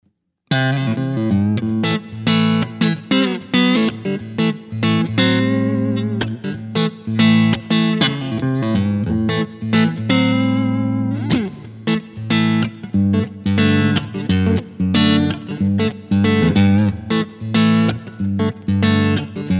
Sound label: EMG T System Clean Neck